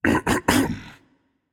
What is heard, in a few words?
clear throat, voicepack; smite; jorm